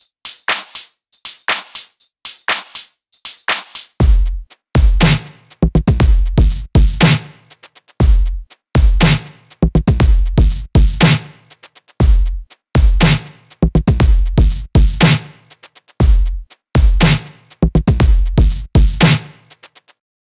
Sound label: rap beat only